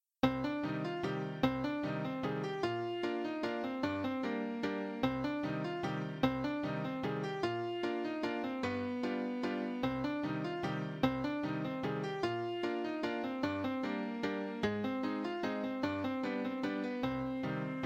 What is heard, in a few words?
C minor waltz